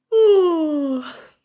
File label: yawning; daisy; SM64 CO-OP